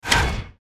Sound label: build car